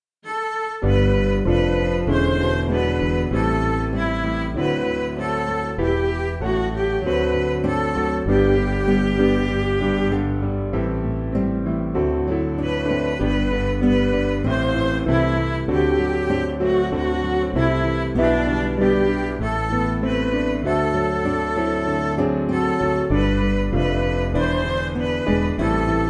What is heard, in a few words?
Midi